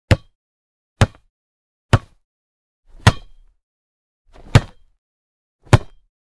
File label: Football ball kick